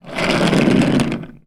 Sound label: toy car plastic roll wood floor; zapsplat